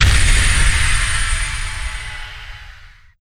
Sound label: hurt from slash